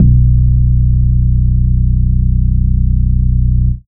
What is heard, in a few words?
PUMP BASS; 90bpm; Demo Multitracks